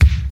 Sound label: present steel kick drum c key